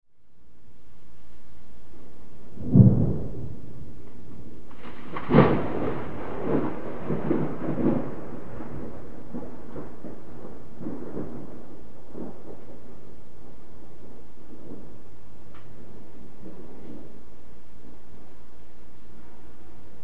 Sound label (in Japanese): kaminari